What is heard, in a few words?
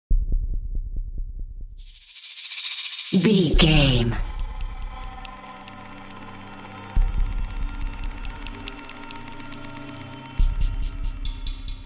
mysterious shadowy figure